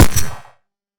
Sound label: Gunshot